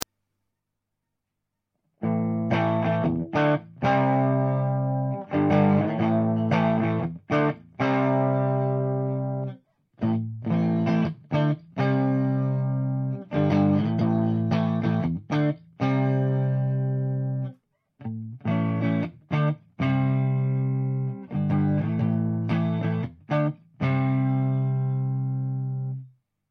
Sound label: tele clean; orange tubefactor; tele pu test; staufer